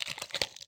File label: spitter walk, creatures